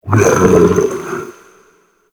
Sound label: Inked Humanoid Large Attack; Enemies; Warframe; Shadowgrapher